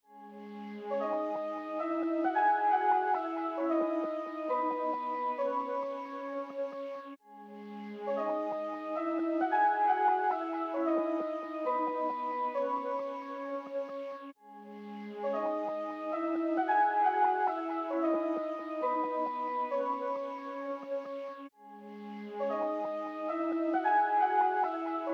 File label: 134 BPM C# Min Lead; Melody; Loop Stems; Cymatics; 2022 Melody Collection - Wavy; Skyline